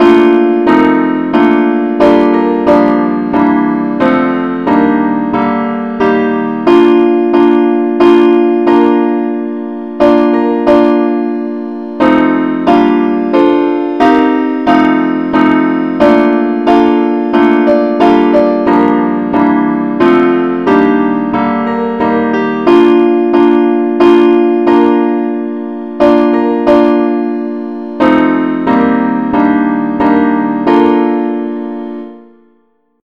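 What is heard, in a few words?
advance accompaniment key B flat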